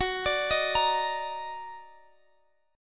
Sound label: Eb Minor Major 7th Gb, chord